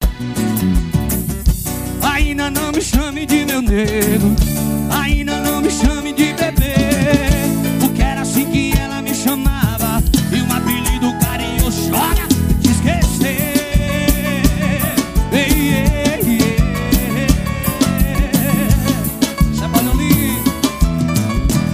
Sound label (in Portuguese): banda ao vivo